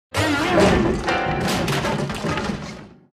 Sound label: piano crashing down; pvz2